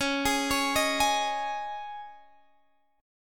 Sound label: Db Suspended 2nd, Guitar, Open D